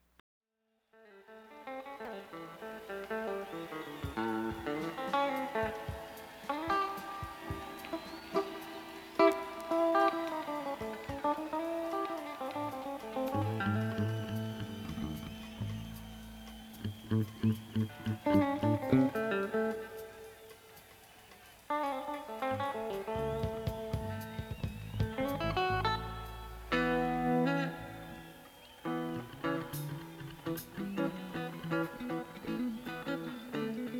Tuning

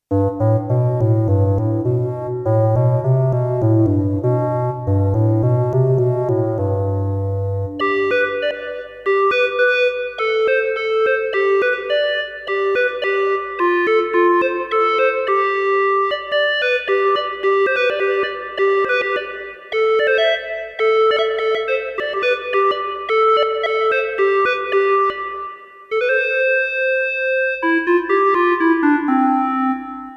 mini square; Kawai K1; Bank 2 card A

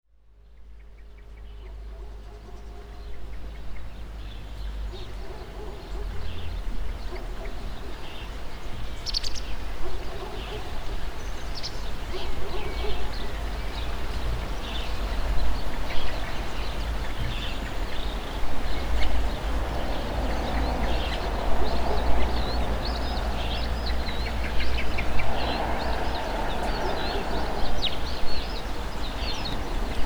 Village Ambience with birds; Luis Antero

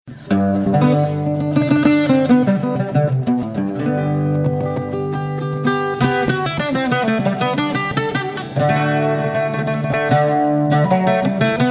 a busking tune; pokstar

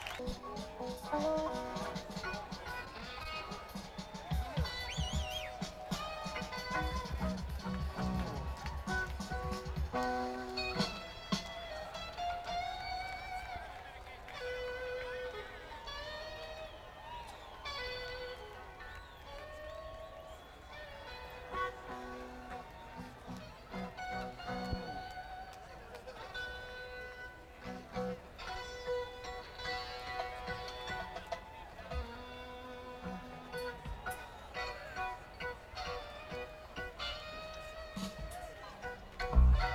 Crowd Tuning

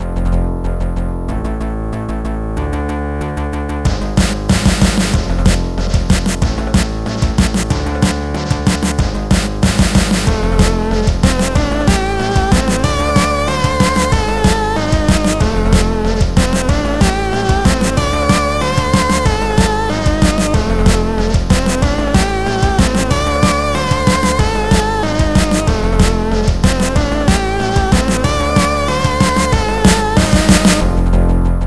Disco Cavemen

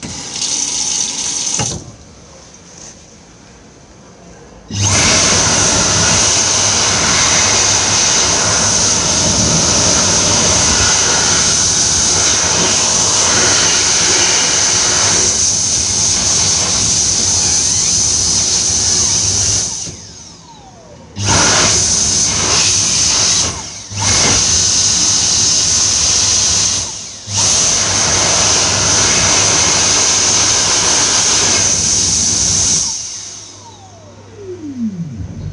hand dryer, airport; hand dryer solos; lisboa

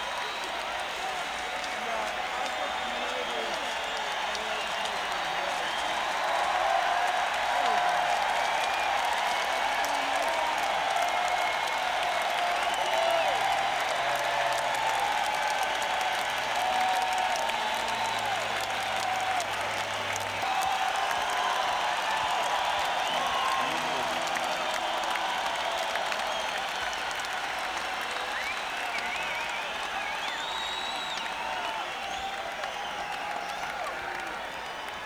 Encore Break; senn421

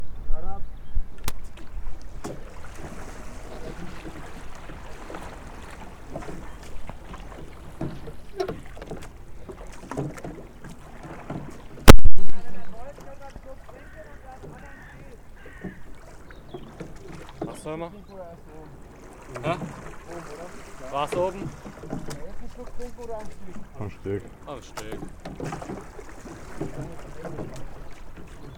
rowing skiff soundscape

